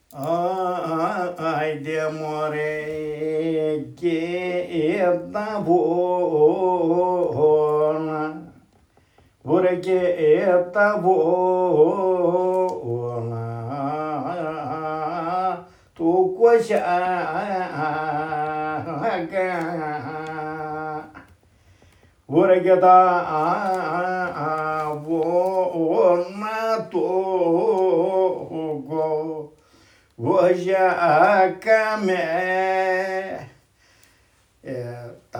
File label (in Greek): Live Recording; Και τα βουνά του Κόζιακα